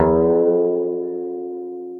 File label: 50s Slide Rules (C3-D#4), Sci-Fi Effects; Ableton; Mood Reel